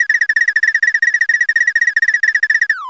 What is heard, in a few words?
spray fish shoot water; N64; YOSHISISLAND